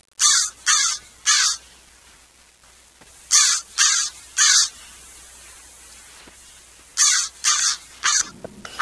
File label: crow